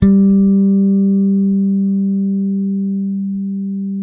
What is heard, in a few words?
SAD4 SUSTAIN, Bass; Sampleheads; Will Lee Bass Library